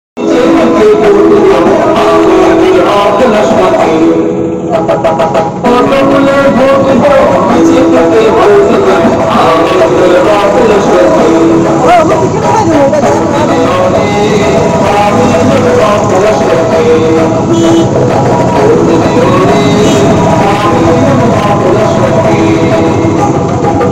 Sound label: Song